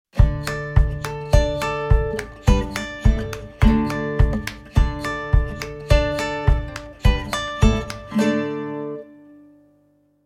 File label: Puzzle completion music; Puzzle Vacations Ireland